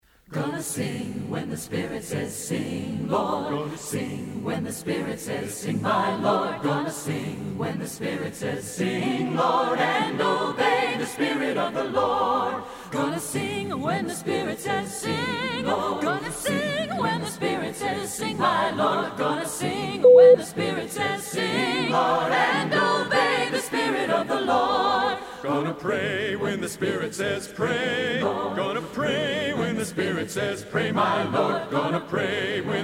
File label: a cappella